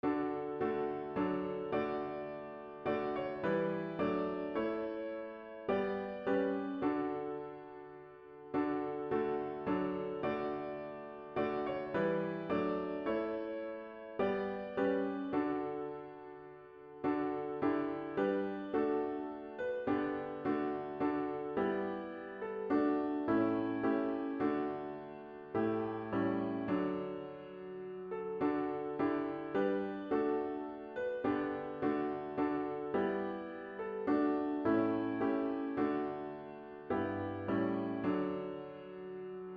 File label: Piano